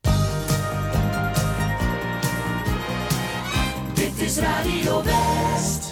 omroep jingles

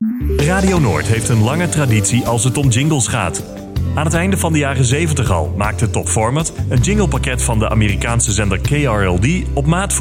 Overzicht Radio jingles; Radio Noord; Regionaal; publieke omroep

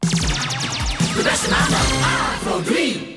omroep jingles

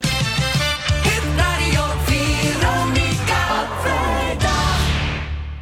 omroep jingles

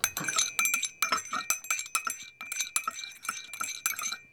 roeren, Home-made Thuis

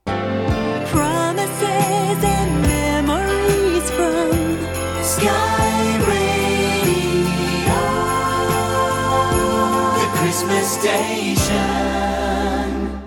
omroep jingles

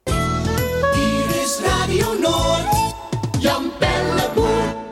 omroep jingles

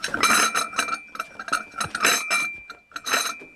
servies, Thuis

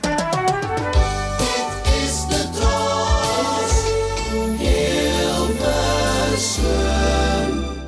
omroep jingles

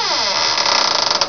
SQUIEK, Deuren; sound effect

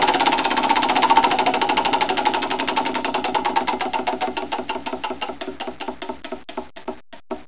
wheel, Foto en video apparatuur; sound effect

